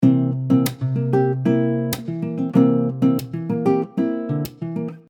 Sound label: Percussive C7 95bpm, Soft Nylon Guitar; Percussive Patterns; MG Soft Nylon Guitar - MIDI Pack